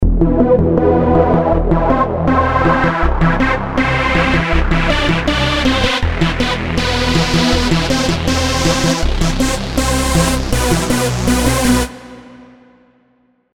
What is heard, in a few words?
ClubRotation loop